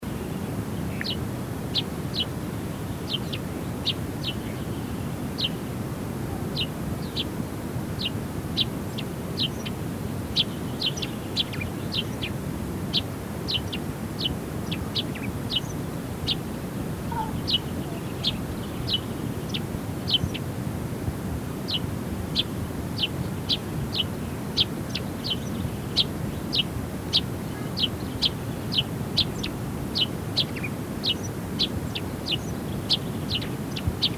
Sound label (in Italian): Passera Mattugia